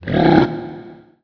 Warrior Burp; Playable Tutorial Level; TR2

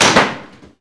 Target Smash; Playable Tutorial Level; TR3

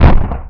Yeti Feet; Playable Tutorial Level; TR2

